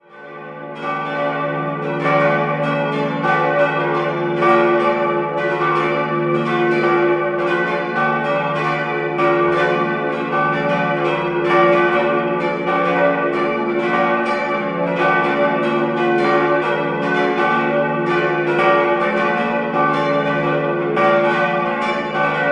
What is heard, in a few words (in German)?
Raitenbuch, Glocken